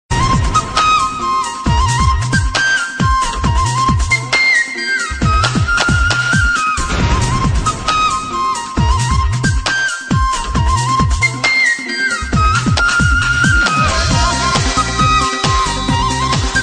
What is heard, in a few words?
Ring Tone